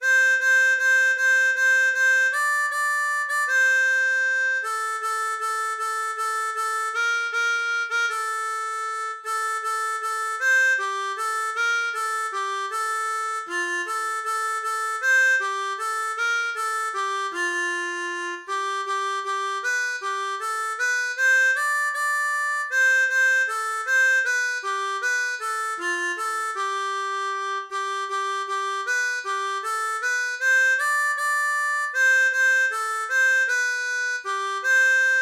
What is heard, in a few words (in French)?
Valse Brune, harmonica